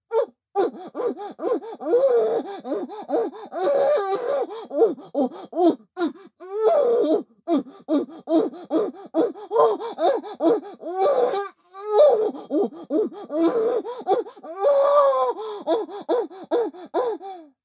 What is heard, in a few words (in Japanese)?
バック・喘ぎ・激しい, ASMR; loop音声